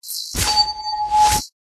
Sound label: Pet Elemental Light